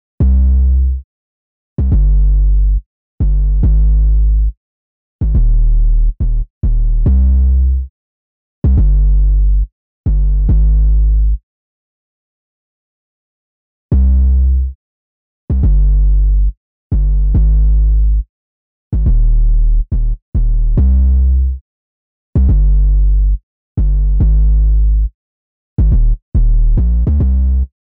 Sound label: 808 Bass